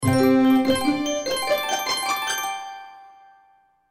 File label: Accent Ascend Strings Twinkle, Musical Harp Glockenspiel Ascend Mysterious; Blastwave